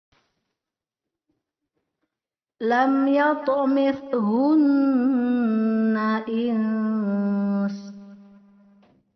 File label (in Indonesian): Perekaman baru Karaoke denoised